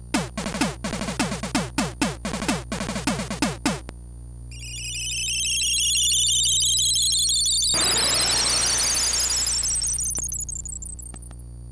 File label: Thunder Drum; links awakening original soundtrack